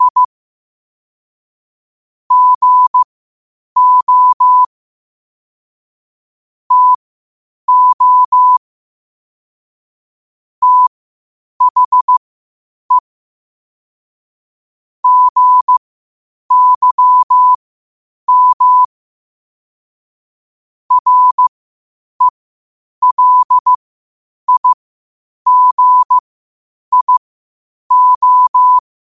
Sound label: 5 wpm, morse; cw; citas